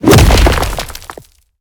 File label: stone punch; battle